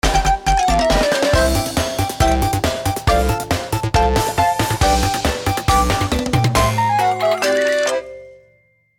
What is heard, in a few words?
Cooking - Failure; paper mario the thousand year door; with Zess T.